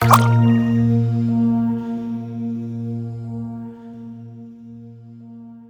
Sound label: Jungle Bounce Wall